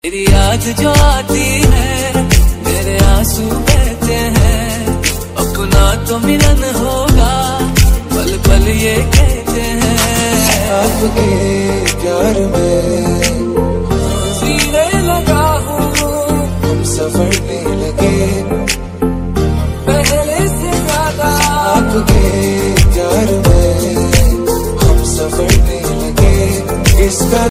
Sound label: romantic ringtone, bollywood